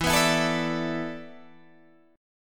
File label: E 7th Suspended 4th, Guitar, DADGAD